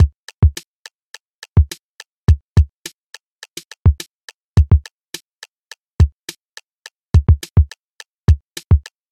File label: Note Block Drum Loop